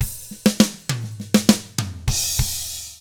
ROCK, Drums

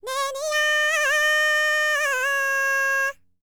BULGARIAN, World Voices, BALKAN

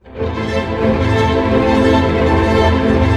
ARP A, STR ARP MIN; Sound On Sound - Peter Siedleczek's Orchestra

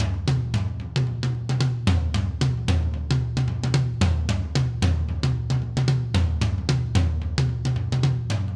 Acoustic Live Drum; Platinum Audiolab

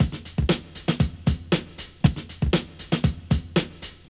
drum loops

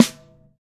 TY Snare, Roland BK-5; Snares

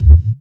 bass drum deep, techno trance drums

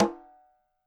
CL op h 1 R, KILLER CONGA, Roland MV8800

